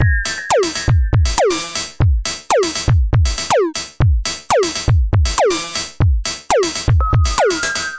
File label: 120 bpm, electro wistfull bells, drum loops and breaks